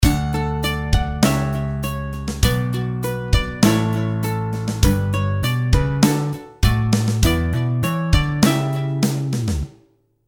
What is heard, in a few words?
delay